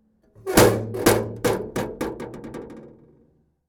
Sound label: Post Office Box Slamming Shut